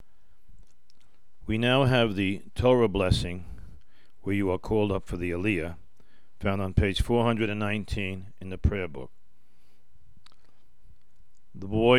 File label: Elohenu Chant